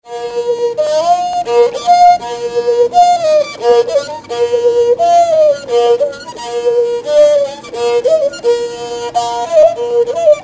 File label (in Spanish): wichi arco musical